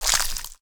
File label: splatter small; Fruit Slicer